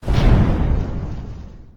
structure blunt; Barotrauma; Subsurface; Damage

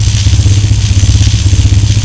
ion cutter loop; Tools; Barotrauma; EK modern

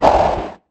crawler attack; Barotraumatic; Cthulhu; InsanityMonsters; Hallucination1

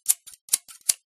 scissors; Barotrauma; Neurotrauma

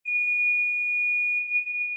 sonar Ping Water Far Beacon Directional; Barotrauma; Real Sonar

